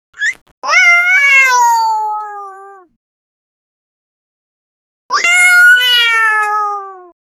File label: cartoon style male cat; animation